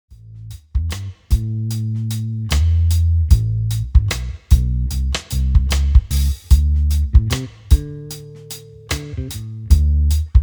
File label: 12 Bar Blues Backing Track (In A); This backing track was used in the Robben Ford Diminished YouTube lesson